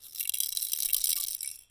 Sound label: toy; Golden Crayon Sounds